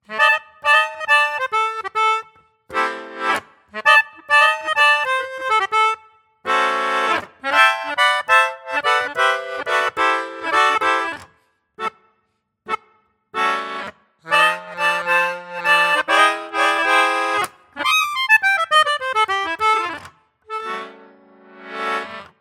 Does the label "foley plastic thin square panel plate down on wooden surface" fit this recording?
No